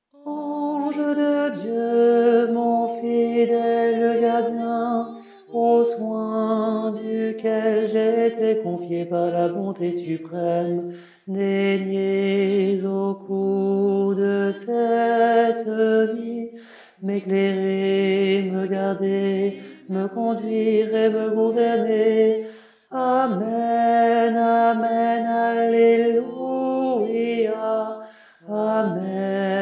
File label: tenor; priere a l'ange gardien